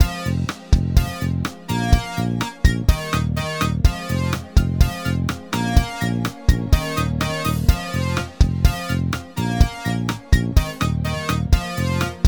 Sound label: robodisco midi